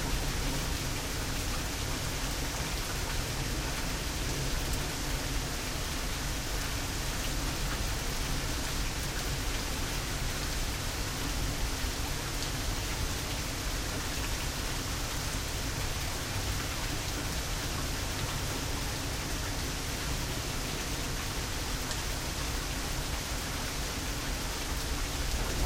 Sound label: Recording Thunderstorm